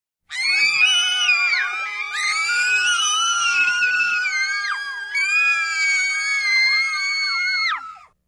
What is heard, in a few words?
5 Kids Scream Very Lng, five-children interior